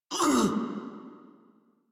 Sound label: Pain, Battle; en; Warframe; Grineer; Catbrow Handler